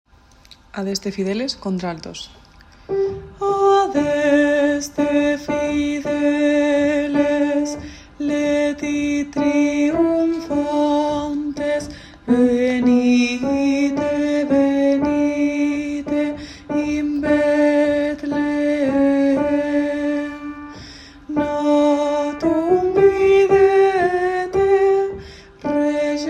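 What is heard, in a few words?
adeste alto; Navidad JOCSMAB 2023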